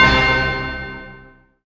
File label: synth glock, dance synth; Sound & Vision - Gigapack I CD 2 (Roland)